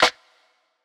Clap, Drums; Ableton Live 12 Lite; Core Library

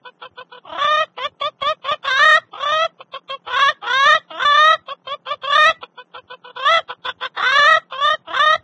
chicken loop; There; ThereClient

